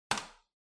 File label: Light Switch-Selector Button (Hall-Hard)